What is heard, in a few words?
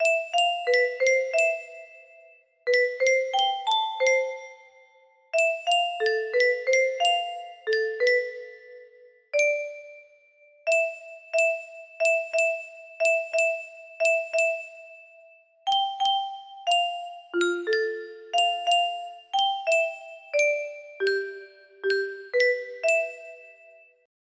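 music box melody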